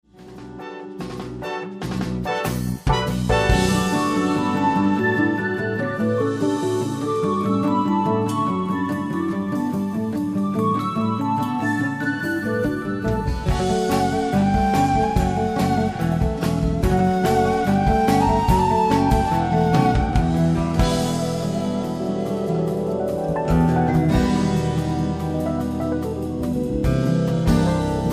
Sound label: keyboard